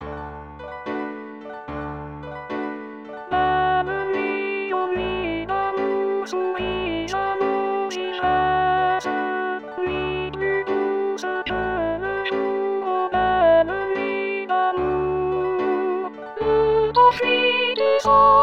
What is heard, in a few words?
Tutti; offenbach; Barcarolle